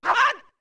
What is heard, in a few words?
Attack; Zora Link's punching/kicking sounds, along with shouts